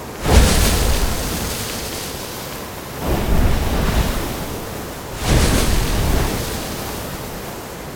sand ship fast

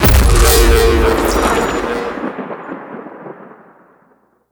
skill thunder

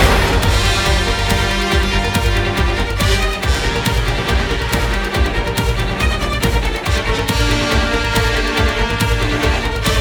exterminate loop

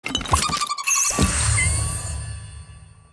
ui level clear

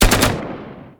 light mach

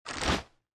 fan

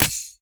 small knife

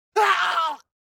male scream

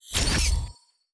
ui game over

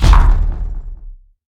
magic

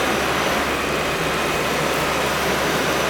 electric bicycle fast

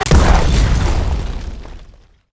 shield

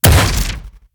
power punch

